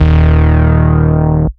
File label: Root D#, Bass